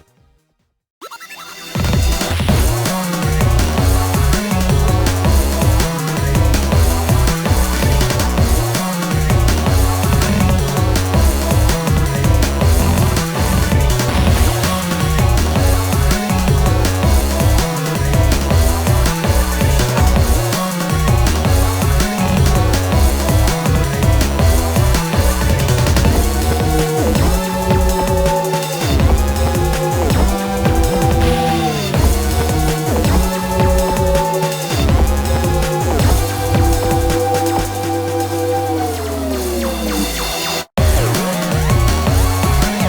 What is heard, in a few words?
Duel Music - Loosing; YuGiOh Over the Nexus